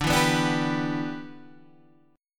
G 6th D

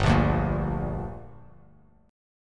G Minor G; strummed; JGuitar

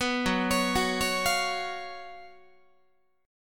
F sharp Major 7th Suspended 4th, Guitar; F#M7sus4 arpeggiated; 14,14,16,16,17,16

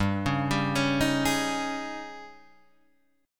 Ab Major 7th Suspended 4th G

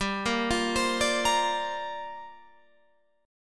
G Minor 11th G down Guitar Open F; Gm11 arpeggiated

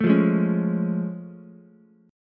A Augmented Major 7th A, Ukulele; strummed

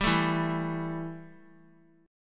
E Minor Double Flat 5th E; strummed; JGuitar

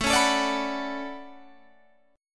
D Major 13th A, chord

